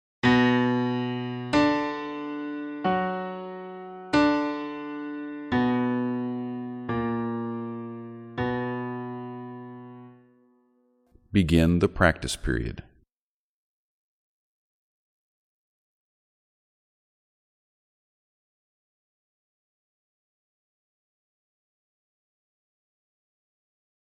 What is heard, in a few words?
b minor with click